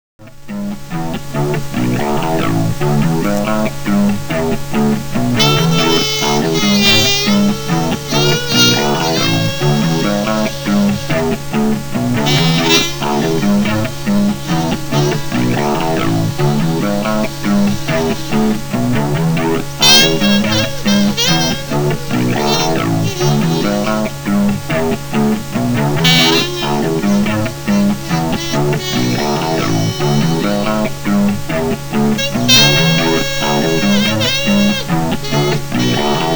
concerto

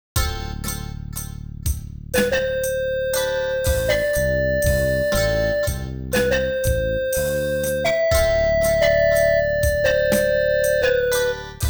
concerto

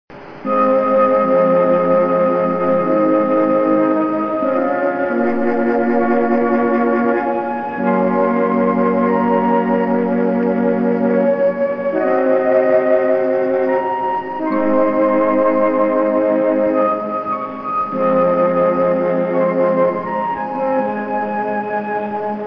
groove

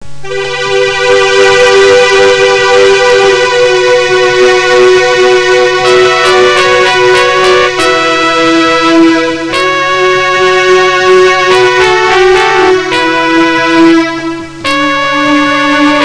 concerto

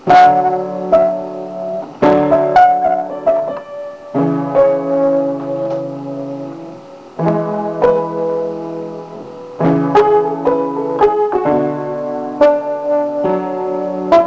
piano

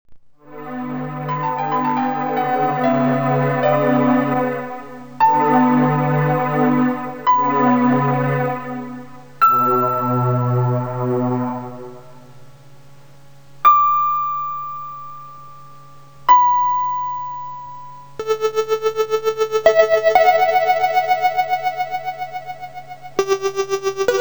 concerto

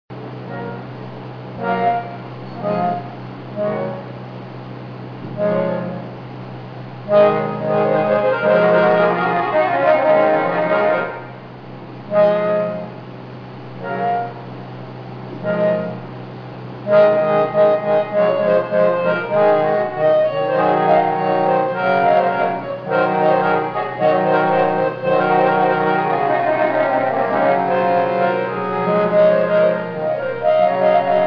Heaven organ

